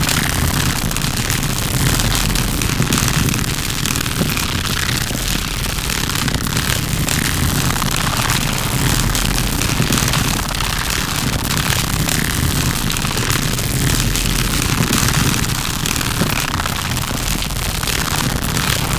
orb loop synth

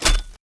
item drop; ui

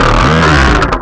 strider pain; creatures strider

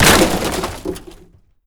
break plastic box; physics; breaking

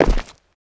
footsteps land dirt; player

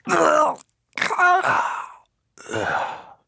death; Counter Strike; CS:GO; phoenix coop

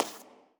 fs tile, footsteps; Portal 2

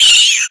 rat die; critters

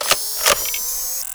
reload, sniper; npc; Half Life 2; Episode 1

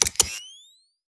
plug connect, multitool; player; Half Life Model Pack

